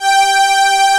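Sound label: JUNO STRING, JUNO 106; Greytsounds VOL-1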